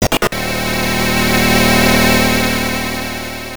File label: Warning; somer assault; turbografx 16